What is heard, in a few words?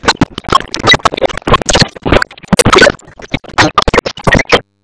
Ambience Thunder; Cinematics; Warsongs; Warsong Prologue